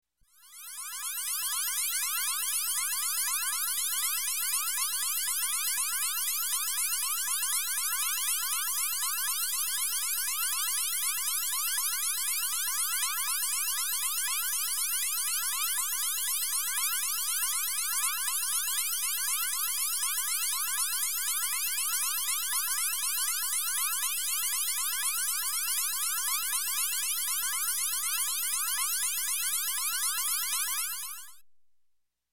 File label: Space Warble Tone, High, Electronic; Sound Ideas 2000 Series